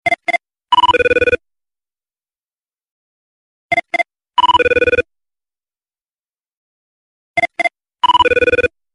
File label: old ringer; ringtones